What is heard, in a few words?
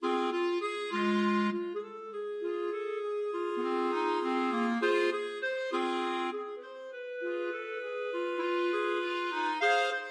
clarinet trio